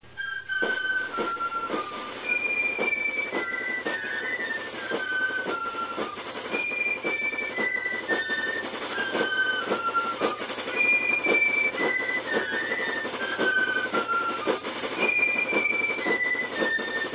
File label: reveille